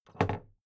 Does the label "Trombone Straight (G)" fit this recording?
No